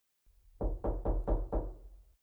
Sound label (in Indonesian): ketuk pintu